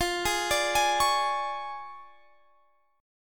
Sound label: D Minor Major 7th Flat 5th, F